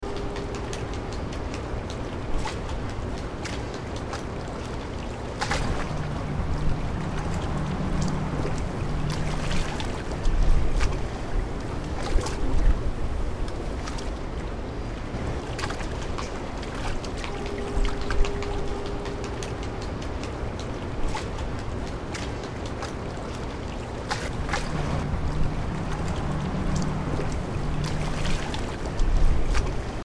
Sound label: harbor docks; morro bay